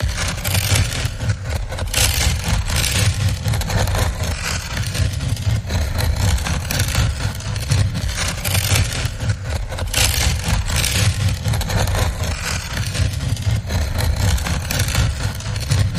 Slopes Glide Pulse

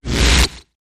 Harsh Ripping Sweep

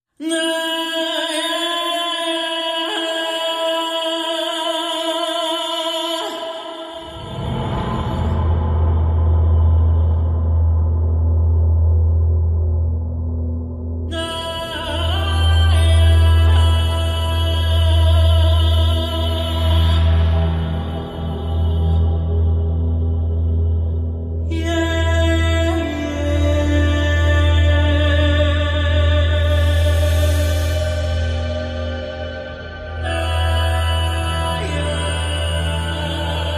No Perc No Pulse

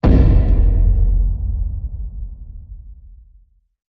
Hit Low Gavel

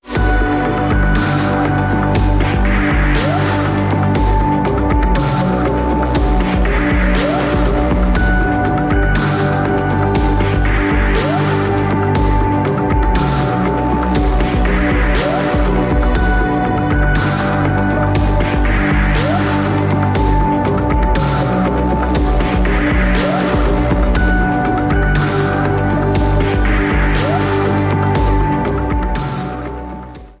Cinematic Texture

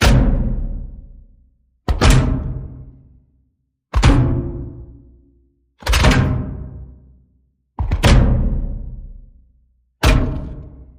Bone Movement Pendulum

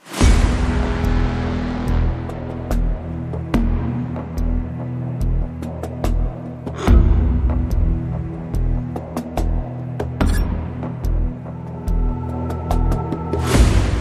No Strings

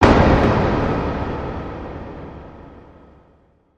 Hit Big Door Slam

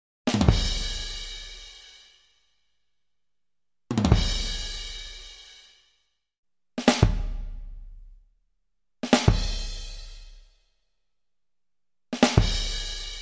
Punch Lines

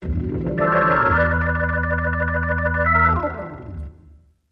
Organ Trill

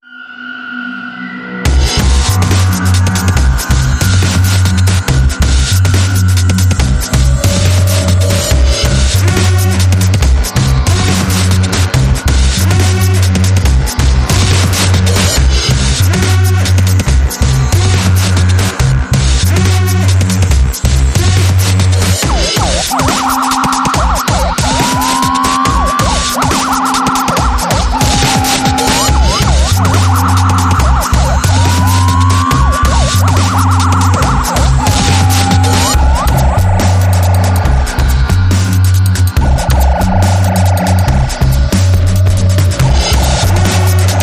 Dubstep

